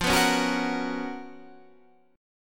C sharp 13th F

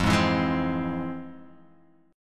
F Minor Major 7th, Guitar Half Step Down, chord

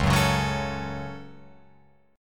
Db Suspended 4th Sharp 5th, Guitar Open F; strummed

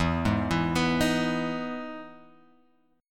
E Major 7th E down Guitar Open G

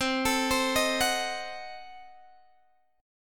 C Diminished 7th C, Guitar Open G

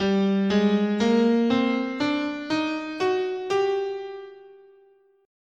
G Neapolitan Minor, scale

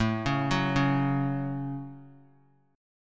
A 6th; arpeggiated

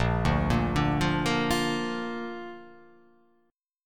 Bb Major Bb down; arpeggiated; JGuitar

